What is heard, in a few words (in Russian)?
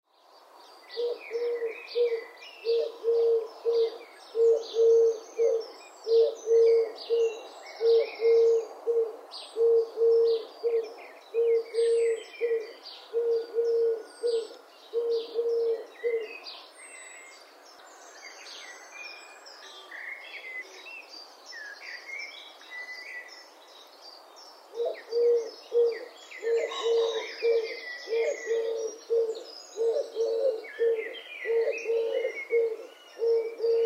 kolchataya gorlica golos